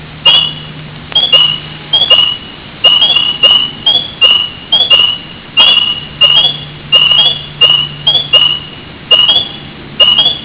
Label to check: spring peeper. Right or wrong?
Right